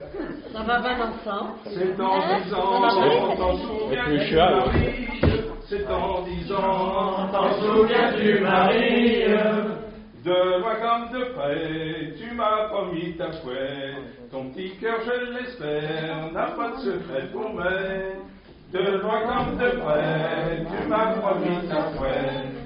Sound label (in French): Chants A Dizaine; cafes galo; special chant